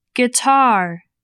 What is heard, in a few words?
guitar